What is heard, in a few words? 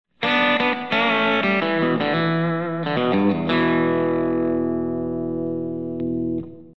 BD2 Clean